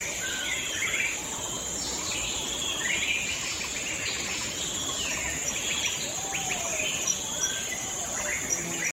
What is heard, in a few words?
jungle